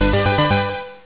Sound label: flipper